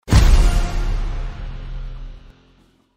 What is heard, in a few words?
succeed